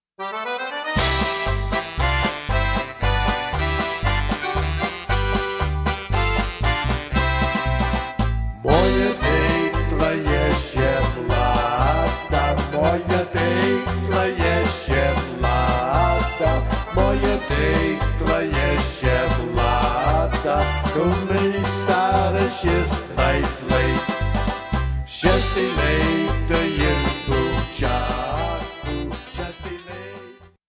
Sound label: Slovenian Polka Medley